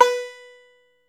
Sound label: ARCHTOP, Arch Top Banjo